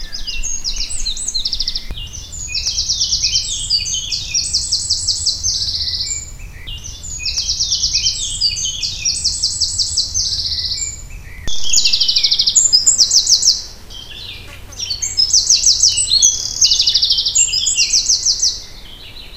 canto verso territoriale scricciolo troglodytes eurasian wren chochin comun; bioacustica

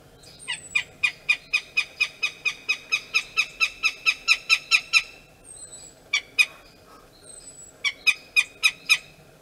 verso astore accipiter gentilis goshawk northern habicht azor autour

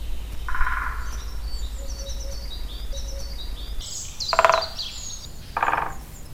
Canto verso tambureggio picchio rosso maggiore picoides major great spotted woodpecker; buntspecht; bioacustica

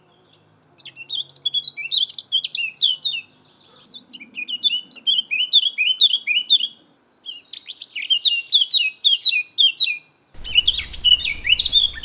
canto territoriale capinera sylvia atricapilla blackcap curruca capirotada; bioacustica

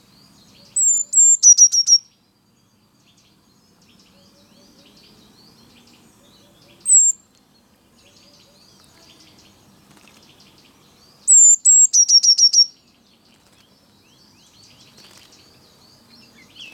verso cinciarella Cyanistes parus caeruleus blue tit blaumeise herrerillo; bioacustica

